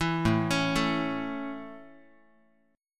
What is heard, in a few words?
A Major A Guitar, chord; arpeggiated; JGuitar; 7,5,4,7,x,x